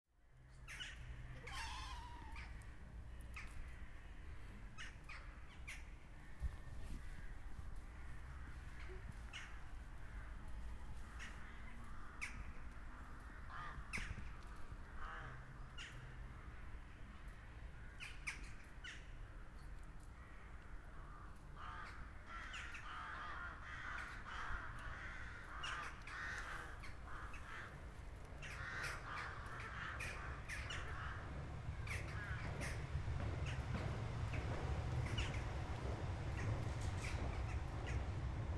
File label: zoo goats birds tram